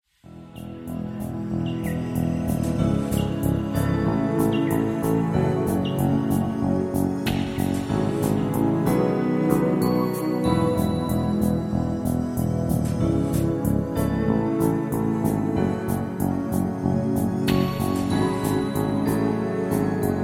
Instrumentalmusik Wasserspiele; musique instrumental

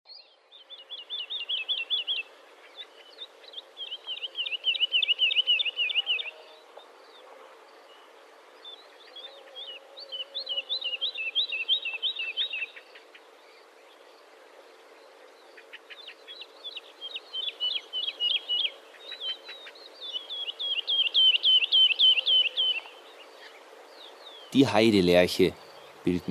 Heidelerche; vogelstimmen in feld und flur

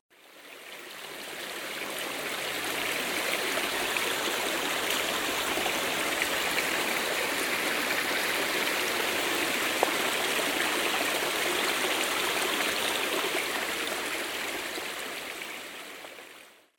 Bachrauschen links rechts; Geraeusche

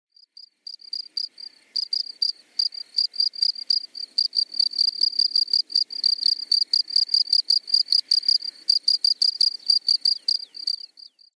Feldgrille; Heuschrecken; heimische heuschreckenarten

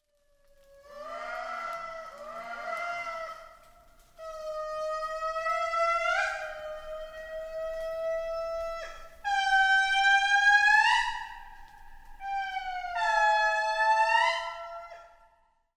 Rufe der Indris, Madagaskar

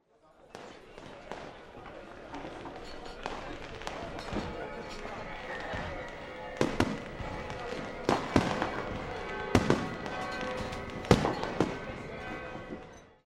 Sylvesterstimmung, Weinberg; rund um den weinberg

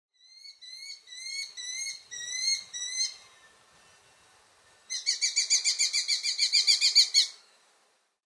Rotfussfalke Saatkraehenkolonie; Brutzyklus

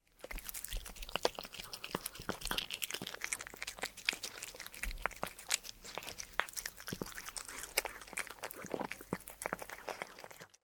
Fressen, Katzenschnurren

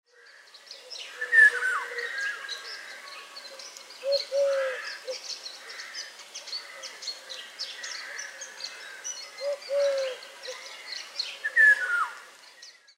PIROL Gesang; vogelportraet; oriolus oriolus

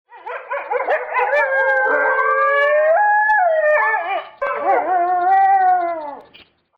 Rotwolf; Woelfe Schakale Hunde; les voix des loups chacals et chiens

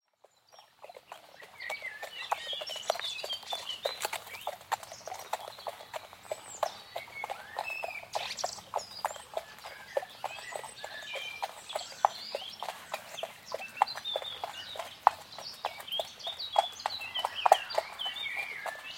Mit dem Pferd in die Natur; Geraeusche

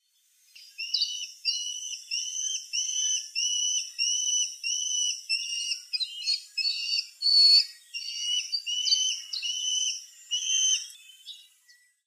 Rotfussfalke Bettelrufe Nestlinge; Brutzyklus

